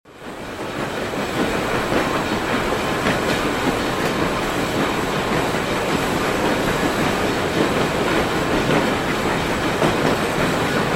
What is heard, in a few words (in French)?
Train vapeur long qui passe lent